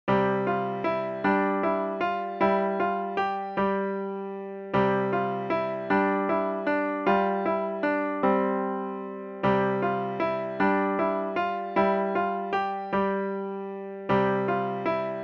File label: Irish Jig; McGuires